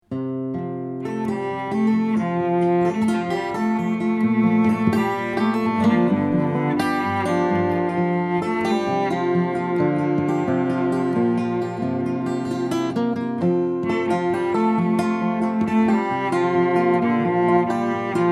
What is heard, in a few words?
Cello Guitar